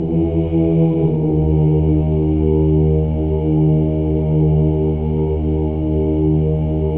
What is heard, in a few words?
Voices